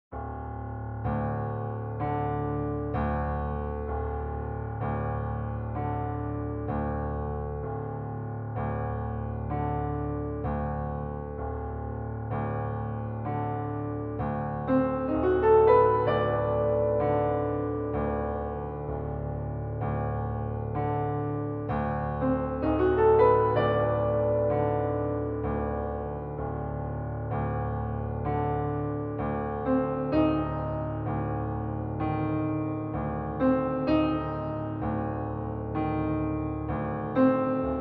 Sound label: Piano Etude